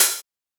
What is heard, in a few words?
Open Hat; Drums; HiHats; Ghosthack Advent Calendar 2017 Day 5